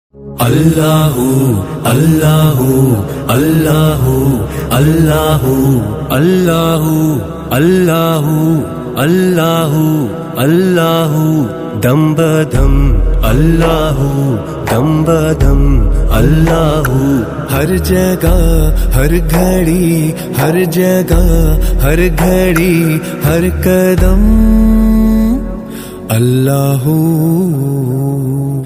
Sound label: Zikr islamic ringtone